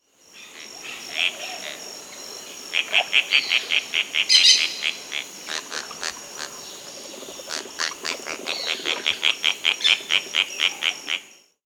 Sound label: Black-tailed Tityra (Tityra cayana); S. America